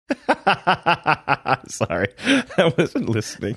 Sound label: laughing saying sorry i wasn't listening